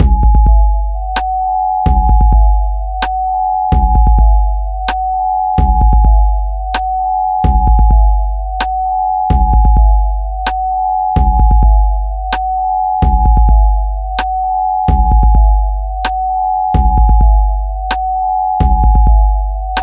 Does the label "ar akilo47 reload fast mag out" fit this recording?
No